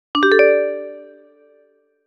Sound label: reply send; TWBlue